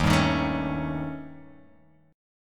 D sharp 7th Flat 5th, D sharp, down, Guitar, Open G